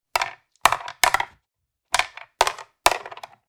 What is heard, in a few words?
Chess pieces drop on chessboard; leisure